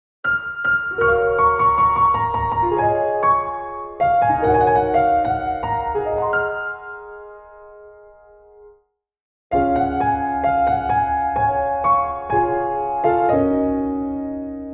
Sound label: Piano Db; 4/4; Piano 8